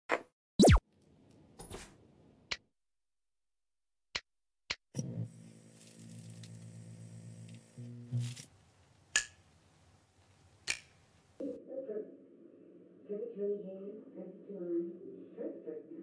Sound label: TV sound